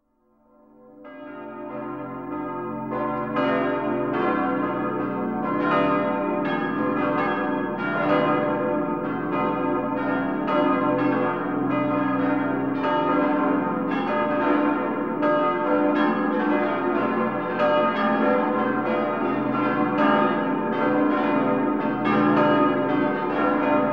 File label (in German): die glocken des aachener doms